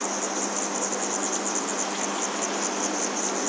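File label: city white noise and distant bird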